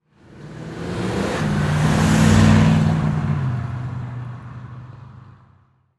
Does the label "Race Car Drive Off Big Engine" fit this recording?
Yes